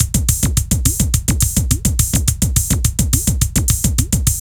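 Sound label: drum loops, right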